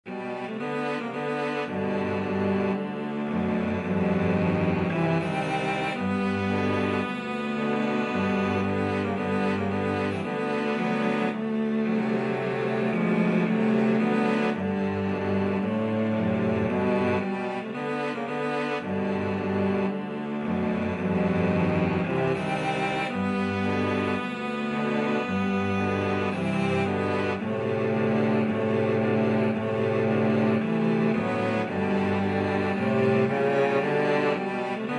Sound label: cello quartet